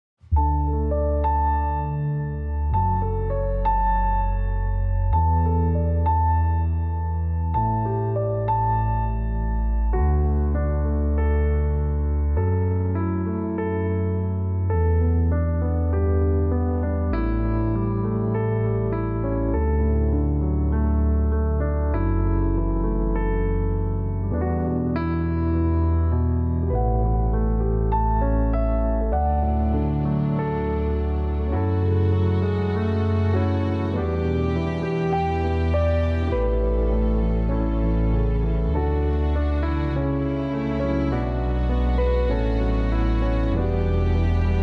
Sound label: Backing Track